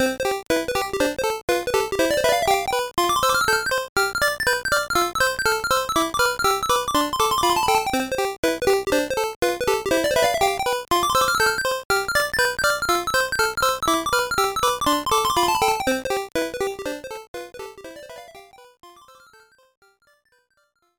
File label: Stage Clear; WL3